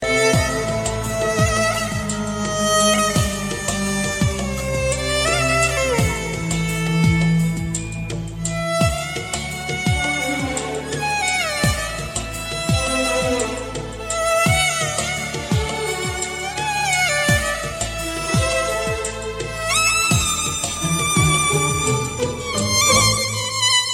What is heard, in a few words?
sad violin ringtone